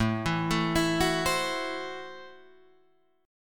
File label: A Minor 6th A Guitar Open D